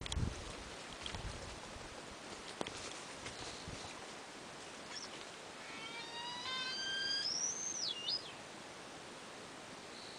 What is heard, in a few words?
Tahiti Petrel Pseudobulweria rostrata